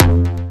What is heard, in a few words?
Bass F, Bass Synth